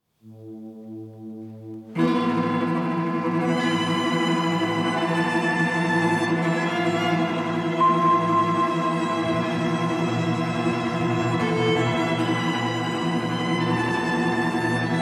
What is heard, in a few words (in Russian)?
napryazhenie solo skripki